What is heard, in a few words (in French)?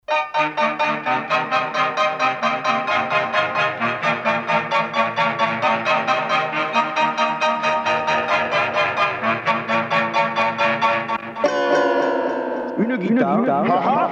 Une guitare